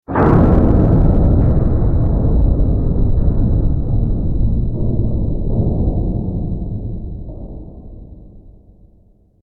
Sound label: hit; scp 106